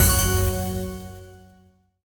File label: big Win End; fireflies